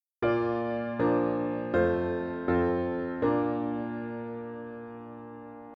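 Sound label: piano, dictation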